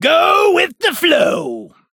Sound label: Grinding Pipes